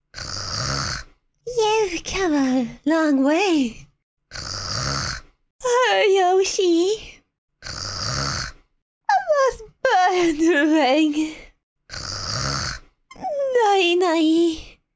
snoring; birdo; SM64 CO-OP

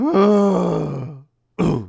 dying; foreman spike; SM64 CO-OP

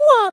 Wah; Neru; SM64 CO-OP; Triple Baka Pack

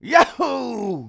yahoo; foreman spike; SM64 CO-OP

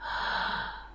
snoring; rosalina; SM64 CO-OP

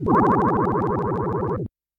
drown; SM64 CO-OP; Junio Sonic

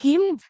Grab; Neru; SM64 CO-OP; Triple Baka Pack

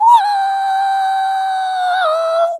Yell; Neru; SM64 CO-OP; Triple Baka Pack

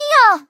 Yah; Teto; SM64 CO-OP; Triple Baka Pack

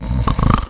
snoring; foreman spike; SM64 CO-OP

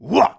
ground pound wah; foreman spike; SM64 CO-OP

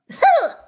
attacked; daisy; SM64 CO-OP

